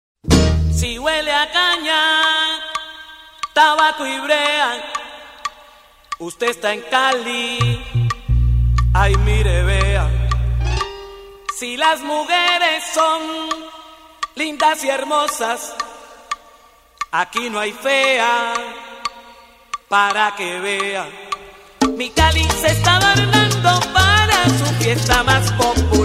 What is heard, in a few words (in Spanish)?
salsa bpm 90